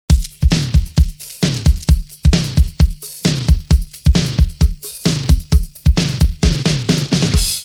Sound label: intro, drums